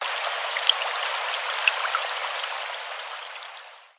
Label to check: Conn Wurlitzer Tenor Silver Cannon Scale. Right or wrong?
Wrong